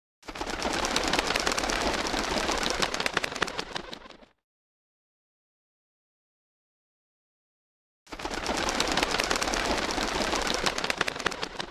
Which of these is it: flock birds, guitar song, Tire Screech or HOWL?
flock birds